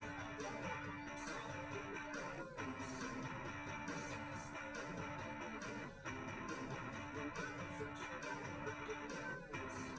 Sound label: wdrums liverec 250ms 1m sim; SiSEC08; SiSEC underdetermined